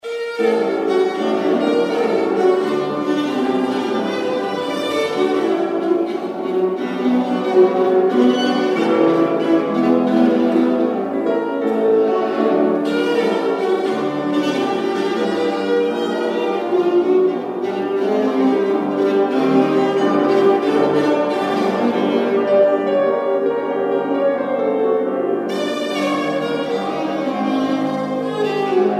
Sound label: viola suite; longo